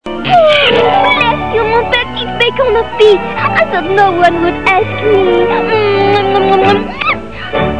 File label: pig